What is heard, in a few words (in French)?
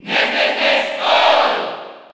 Cheer French; Ness; SSB4